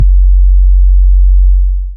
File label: Kick hum; Sonic Foundry (Sony Creative Software); Ugly Remnants VOL-2; Beatstuff